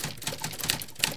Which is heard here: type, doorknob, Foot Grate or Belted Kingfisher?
type